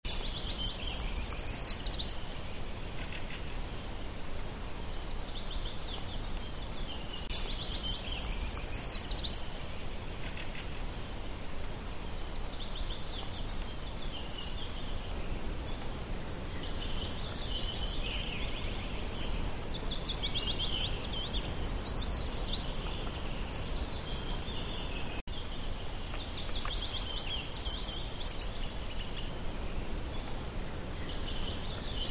Certhia familiaris

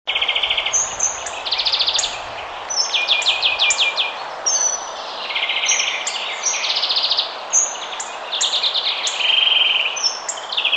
Coccothraustes coccothraustes

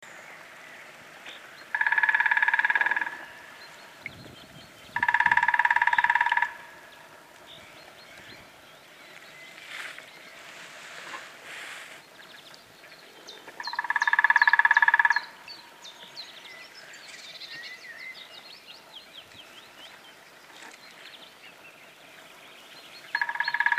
Dendrocopos minor

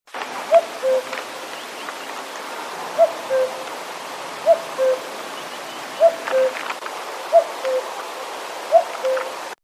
Cuculus canorus

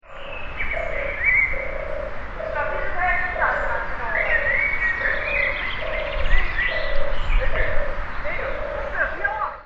Streptopelia turtur

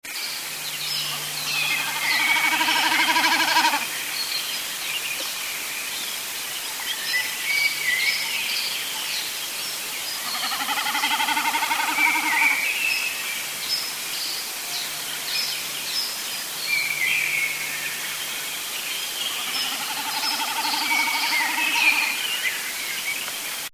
Gallinago gallinago

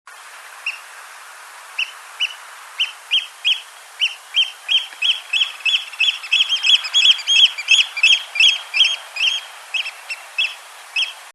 Haematopus ostralegus

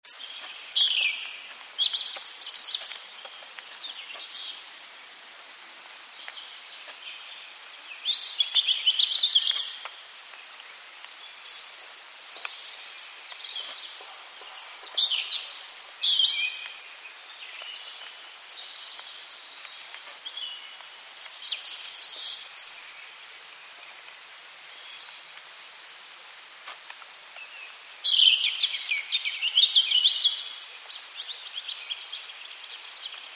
Erithacus rubecula

